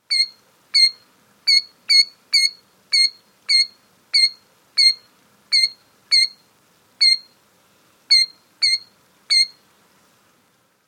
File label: KILL ground alarm, bird; bird language; What the Robin Knows: Bird Language Audio Library